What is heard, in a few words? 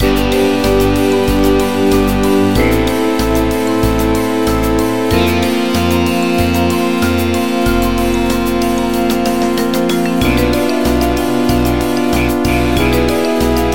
Final Battle